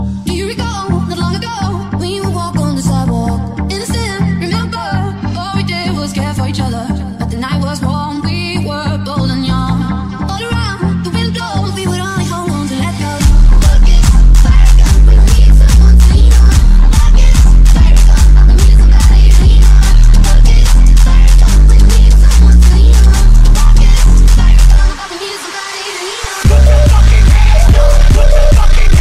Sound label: Bass